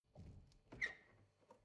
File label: refrigerator door open squeak